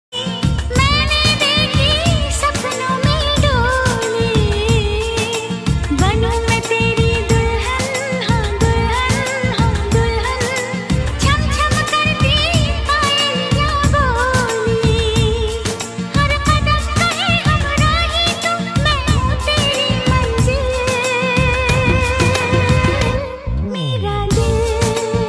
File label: Old Love Song Ringtone